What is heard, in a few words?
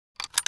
springfield reload fill, weapons foley; Spearhead Demo; MacFormat 2003 June